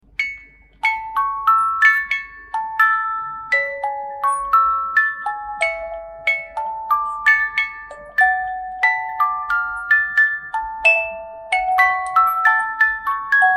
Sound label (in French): boite musique